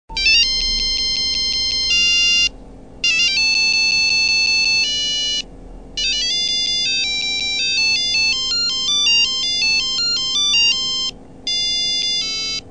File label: flute, Nokia Ring Tones